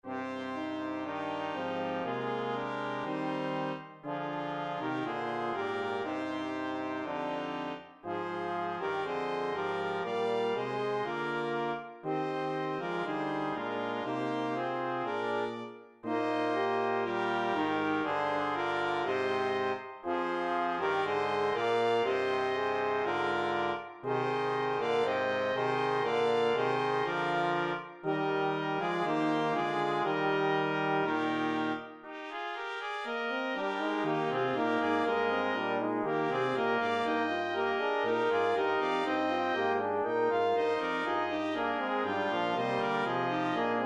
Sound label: Fanfares